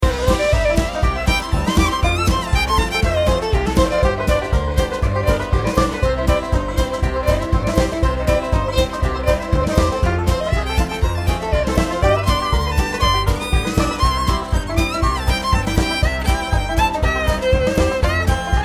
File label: music country; darkestville castle